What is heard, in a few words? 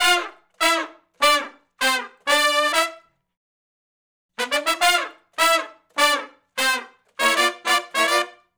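Funk Riff (G) unison, harmony, Horncraft for R&B; Sections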